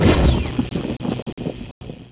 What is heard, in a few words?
Laser Explosion; Warzone